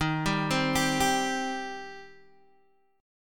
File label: Eb 6th Eb down Guitar Open G